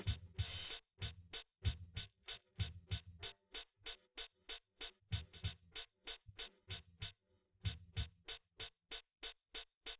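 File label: with drums, instantaneous mixture; SiSEC11; underdetermined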